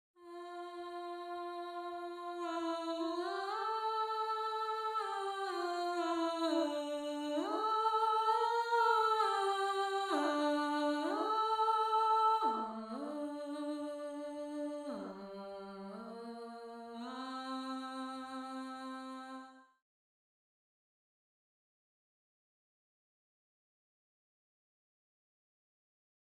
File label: Alto, counterpoint